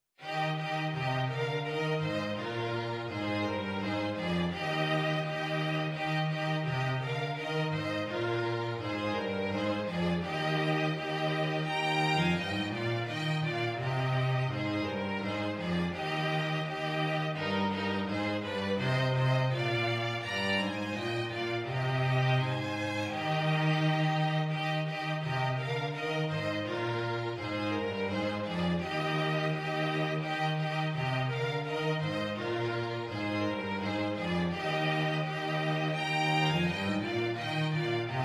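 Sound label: Good King Wenceslas violin violin cello; Christmas; trios for the december holidays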